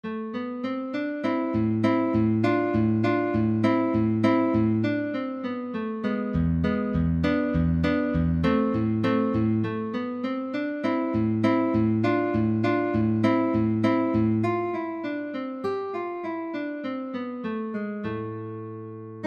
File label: andante a minor, guitar; carulli